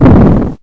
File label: explosion; Space Wave Race